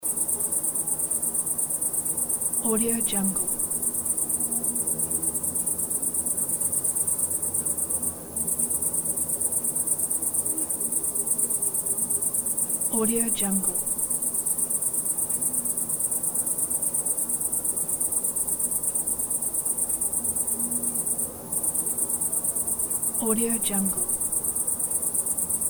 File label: cicadas; soundfoodsfx